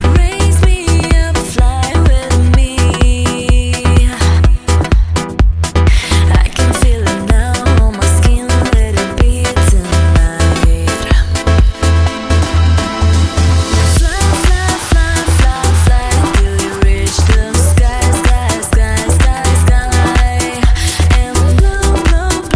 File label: Crystal Wood